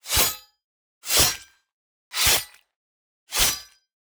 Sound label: Spear 2H